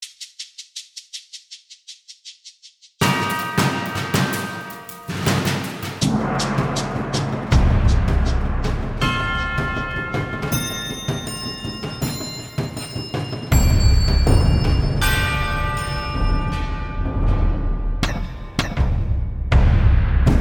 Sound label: Bells